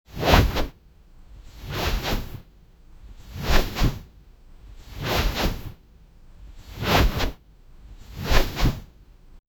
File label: cinematic soft fabric, textile